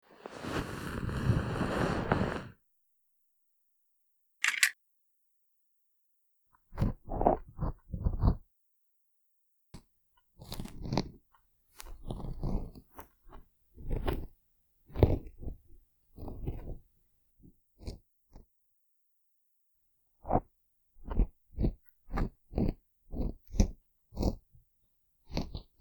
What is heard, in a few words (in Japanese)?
耳掻きされる音声作品 セリフなし版; ヤンデレに拉致監禁されて